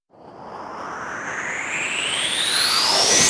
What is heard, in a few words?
FX